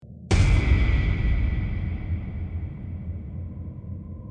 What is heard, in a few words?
caught sound; dandy's world; roblox